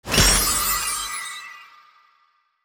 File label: key Landed; netent